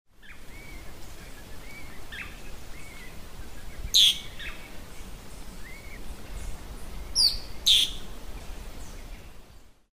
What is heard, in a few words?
Ceratopipra rubrocapilla Cabeça encarnada